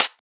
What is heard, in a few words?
Closed Hat